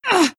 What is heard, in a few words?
pain; queenofpain